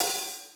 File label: half hat